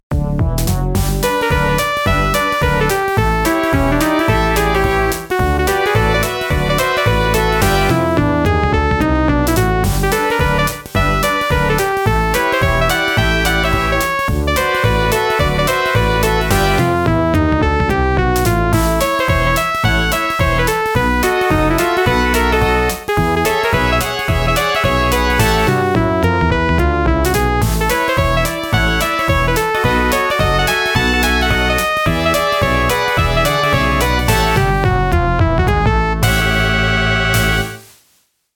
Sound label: OPL-3 FM